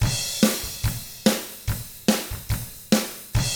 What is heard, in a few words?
ROCK, Double Platinum Drums